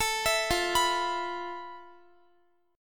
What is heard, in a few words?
F Major Sharp 11th, Guitar; arpeggiated